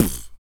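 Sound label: vox percussion, Vocal Planet Jazz FX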